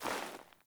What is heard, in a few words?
Sandals Walk Snow, Footsteps; Characters; Player